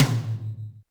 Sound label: Yamaha RM 50 Toms